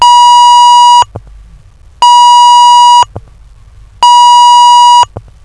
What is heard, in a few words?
tone